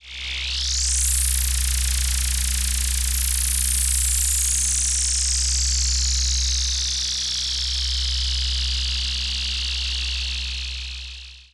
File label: Roland E Noise, Roland S100; musicradar-synth-samples